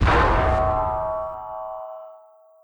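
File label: Rivet Hit Exterior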